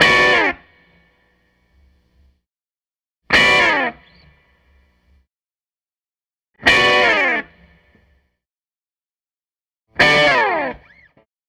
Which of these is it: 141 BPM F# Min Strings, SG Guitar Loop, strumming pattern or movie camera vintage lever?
SG Guitar Loop